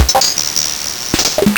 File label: Nintendo Gameboy LSDJ CR78